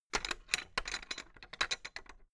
fence gate latch rattle hard; objekte; tueren

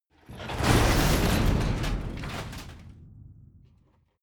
metal long pieces, industrie wirtschaft metall

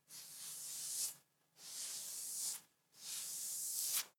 hair short hard comb sequence; berufe; friseur

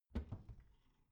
bathroom cabinet door creak open soft

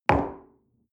coffee place table soft short, restaurant cafe

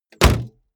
car bmw e46 exterior bonnet close

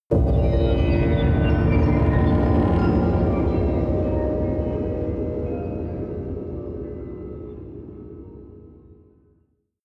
constricted, impacts

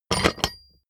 metal small scratch wood; industrie wirtschaft; metall

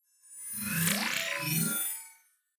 swell short metal string computer; sweeps-swells

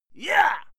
male fight scream yah short

